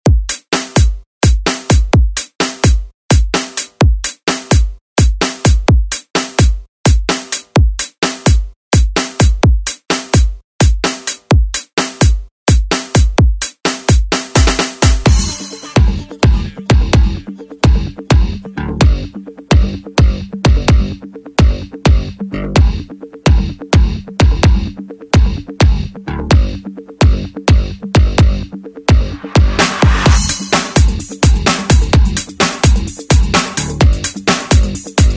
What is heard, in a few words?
Oldschool Redrum; Deejay Hit; Russian Radio